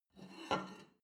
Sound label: plate take table slide, gastronomie; soundpool